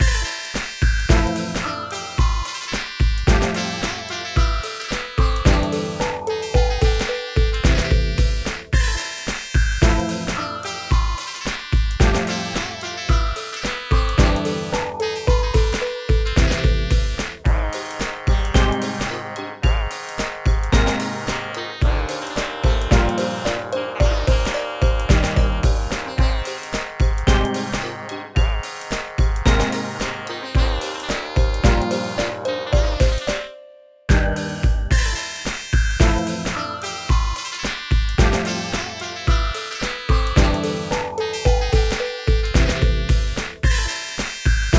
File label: Ethnic Recipe - Serving; 2006 Version